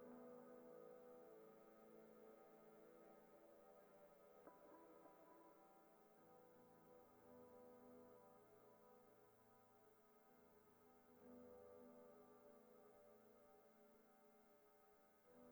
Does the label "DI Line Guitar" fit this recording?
Yes